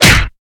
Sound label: melee impact; smite; vamana